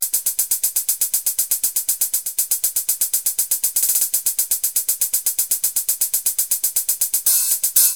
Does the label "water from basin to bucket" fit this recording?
No